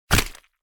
Cartoon splat

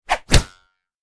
Cartoon slap; cartoon impact

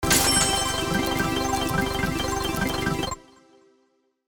Slot machine casino multiplier win boost

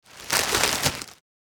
Thin shopping bag

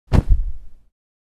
Fire witch cauldron

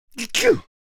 Man quiet sneeze; sneeze sound effects; gfxsounds

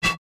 Blowing into glass bottle

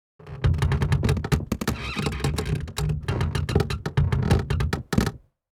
Wood short and heavy creak earthquake designed

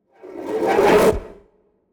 Cinematic metal whoosh

